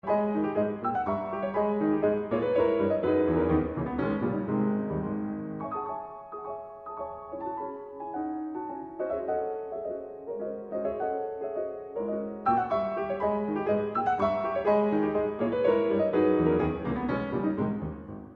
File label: Piano Sonata in C-Sharp Minor Scherzo Allegro Vivo